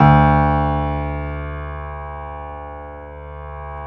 PIA GRS, PIA_GRAND, Roland

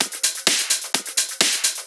Clubhouse Loop 128 BPM

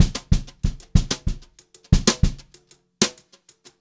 Brushed Drum Loops; USB Soundscan vol.39; AKAI

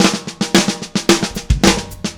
Drums Intro, Indie Rock; Sonic Foundry (Sony Creative Software); Indie Rock Anthems; Session 01 - Brighton

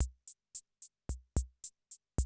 CR78, DrumLoops

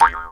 E JAW, Primitive Instruments

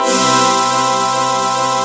XCH 18 MJ7, HOUCEY CHORD; AKAI S6000 CD-ROM - Volume 1; SYNTHESIZER

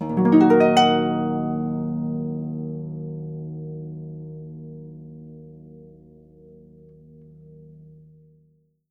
D#, Percussion & Harp; Miroslav Vitous

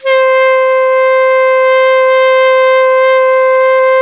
ALTO PP C 4, SAX; Greytsounds - Philip Wolfe David Crozier's Saxophones

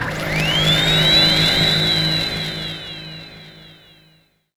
fx reverse; Ueberschall; Techno Trance Essentials

